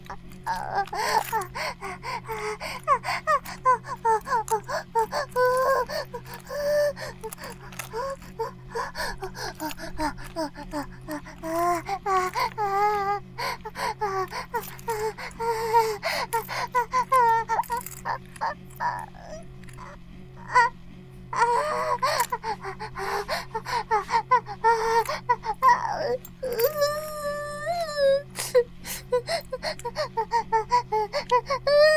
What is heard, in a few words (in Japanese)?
バイブ放置, ASMR